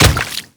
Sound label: Water Step; Warframe; Grineer; Royal Guard; Footsteps